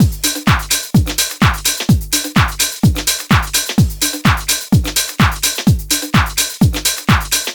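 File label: 127 Tech House Drums Full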